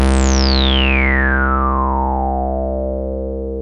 Bass

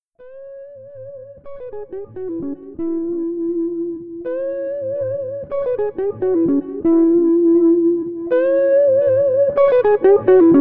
Psychedelic Guitar Looped

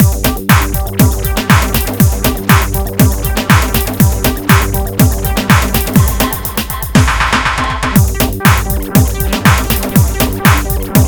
Acidish Loop

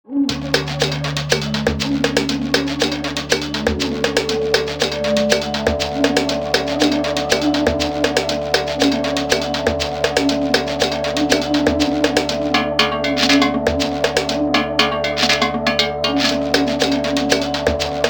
Voodoo Intro

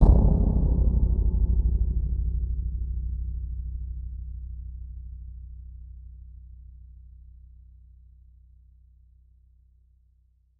Eerie Tense Hit

